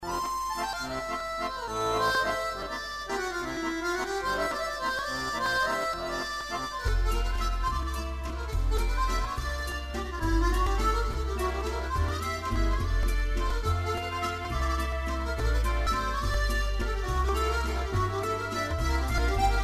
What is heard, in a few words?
technics kn5000